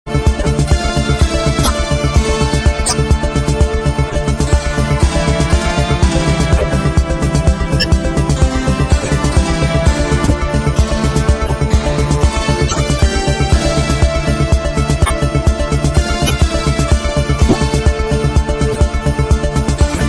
Synthesizer Sounds

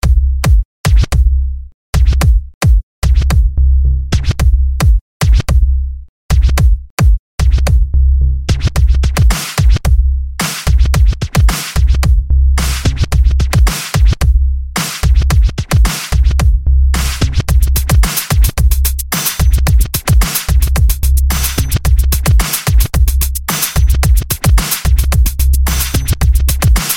Beats

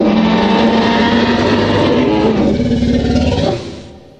roar; Rexy

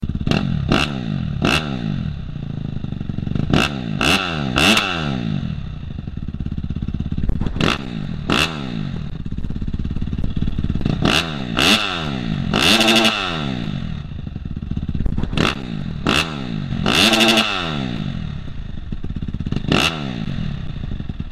450f Dirtbike

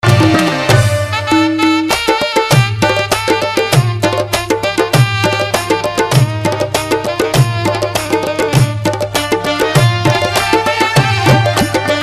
Farsi Ringtone

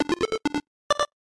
8-Bit Notification

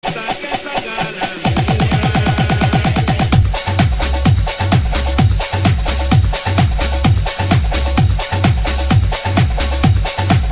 Guaracha

